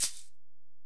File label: Ac Perc, Drum Machines Alesis DM-PRO